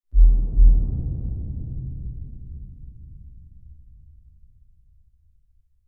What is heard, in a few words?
Sub Woofer Heartbea, heartbeat